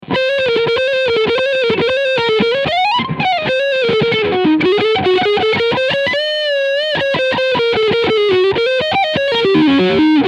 Solar Guitars SB1.6 Lead Sound Front Humbucker

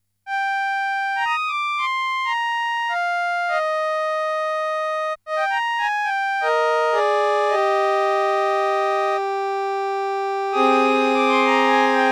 Casio MT 400V Violin Cello

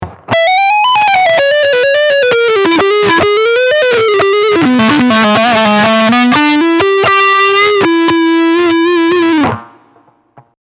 harley benton te 90qm hh trans blue

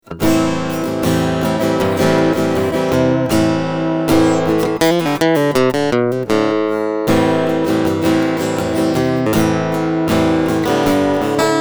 Strumming EQ Mid Contour

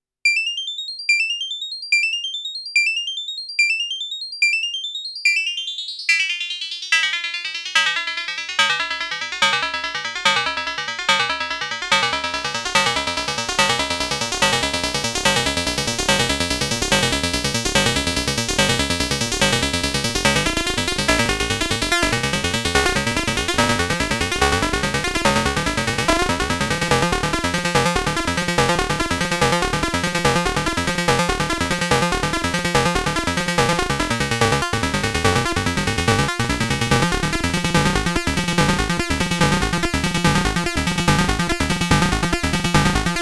Moog Mother 32 Multi FM

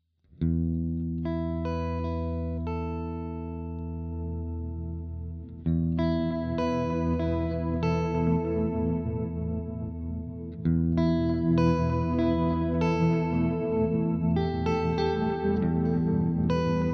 Riff

